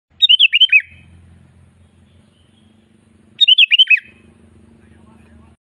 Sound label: common nightingale female; برای جلب جف; هزار دستان ماده